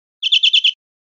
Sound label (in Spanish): chorros cortos campaneados, verderon